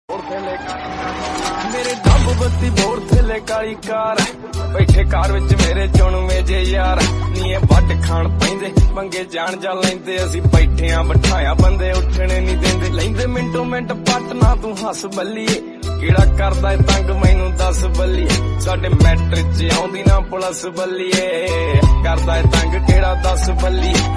Punjabi Song Ringtone

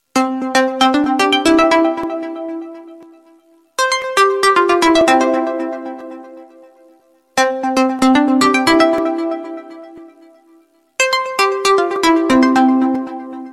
Nokia 8 Tones Ringtone; ringtones

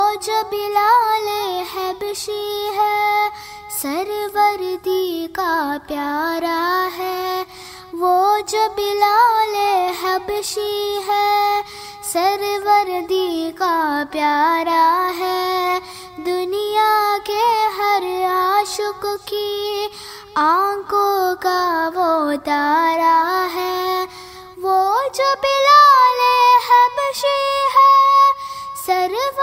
Islamic Ringtone; Bilal i Habesi ibn Rabah

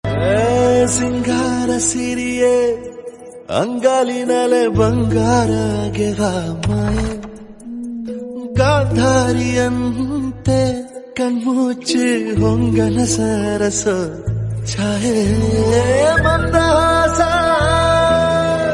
Romantic South Song Ringtone; Kantara

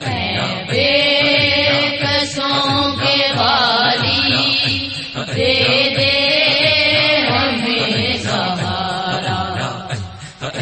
New Naat Sharif Ringtone

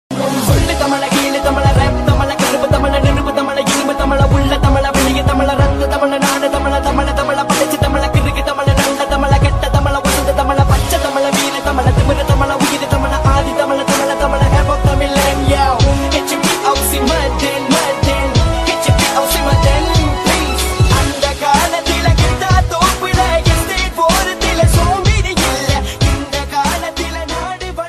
Tamilan Rap Ringtone

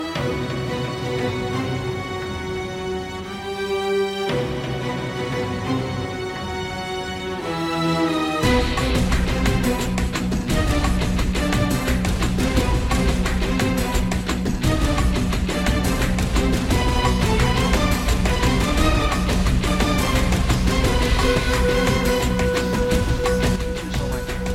Hollywood Ringtone; ringtones

